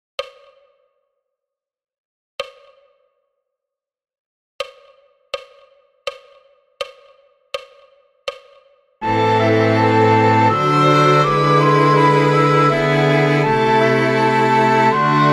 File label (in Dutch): sopraan; Flierefluiter 5